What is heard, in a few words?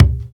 short kick single hit c sharp key; kick drum samples